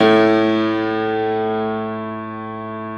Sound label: Classical Piano; A0; Best Service ProSamples vol.53 - Classical Prepared Pianos; Total Piano; Brilliand Classical Piano; Classical Piano mp +ped